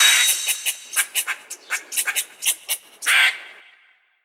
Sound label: Alarm Spell; pf2e; the seventh arch